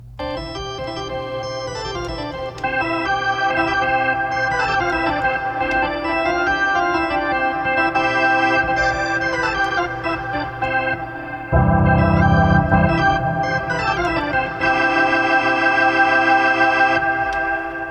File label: organ Plate Chamber; Labyrinth